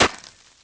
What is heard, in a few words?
ice light collision